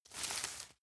grab crunch short medium

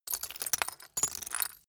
glass debris fall sequence short

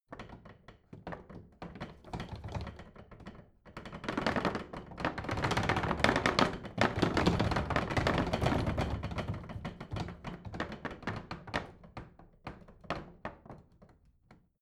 bathroom drawer rattle

